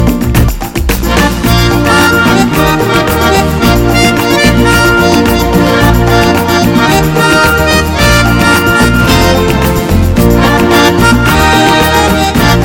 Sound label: Super Latin Pop